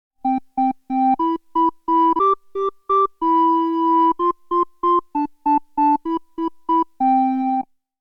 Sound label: Accordeon